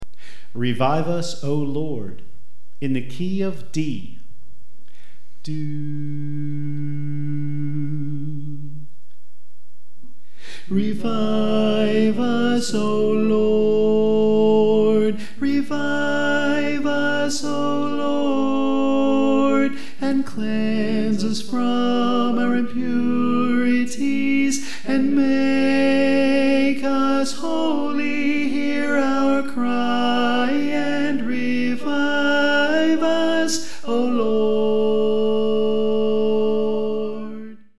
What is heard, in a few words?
Tenor